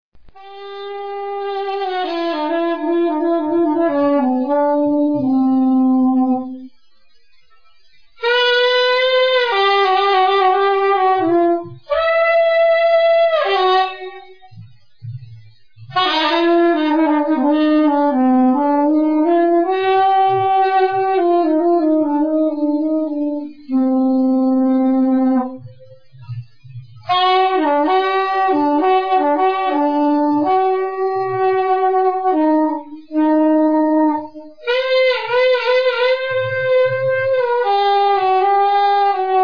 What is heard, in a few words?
sax